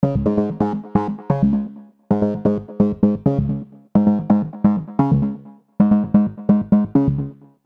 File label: Bass More Sub; Arturia KORG MS 20 V; Dist OPAMP 21